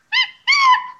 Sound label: monkey taunt; monkeyspank